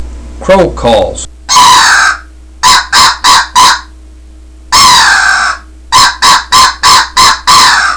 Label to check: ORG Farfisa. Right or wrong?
Wrong